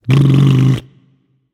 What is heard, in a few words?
tongue; smite; achilles